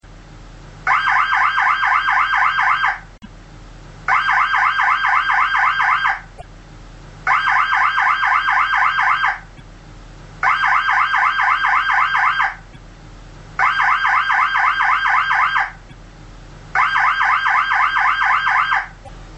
Anhaltehorn; Blaulicht

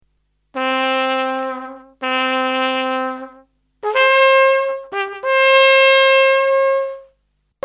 wasser halt rohr 2, Hornist

